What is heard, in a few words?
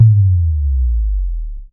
Sub Boom